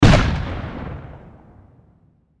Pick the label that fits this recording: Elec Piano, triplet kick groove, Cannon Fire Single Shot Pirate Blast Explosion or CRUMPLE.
Cannon Fire Single Shot Pirate Blast Explosion